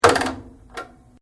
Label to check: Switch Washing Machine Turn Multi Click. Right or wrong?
Right